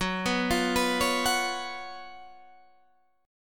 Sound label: Gb Major 7th Suspended 4th, down, Guitar, Half Step Down